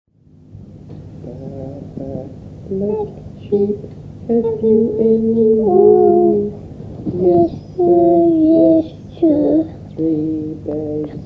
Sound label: Song Baa Baa Black Sheep 18 months; karaoke